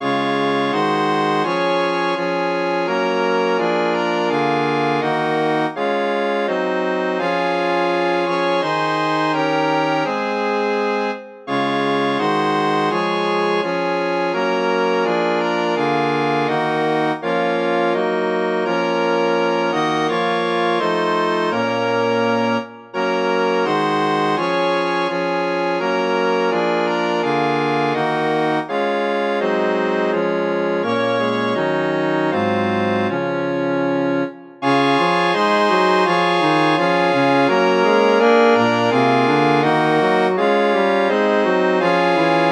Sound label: Chorale for harmonium; synth